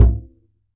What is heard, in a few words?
Upright Acoustic Bass; 1ST POSITION; Larry Seyer